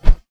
hammer swing; melee; tfa starwars melee weapons pack

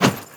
bat light; melee weapons; tfa starwars melee weapons pack

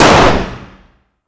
fire dist 9x19 pistol int; gmod; arccw uc commons attachments

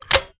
203 close, 40mm; gmod; arccw uc commons attachments

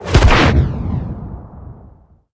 rocket; gmod; lfs tx 130

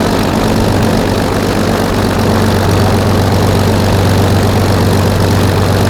engine mid, bf109; planes; gmod; lvs planes

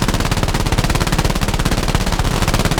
mg loop, planes weapons; gmod; lvs planes

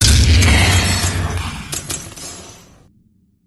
emp; gmod; masita explosive expansion workshop content

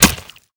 sharp light; tfa starwars melee weapons pack; nmrihimpact

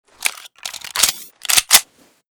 dc17s empty

